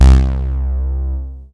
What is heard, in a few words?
BASS C2; Q Up Arts; Dream Experience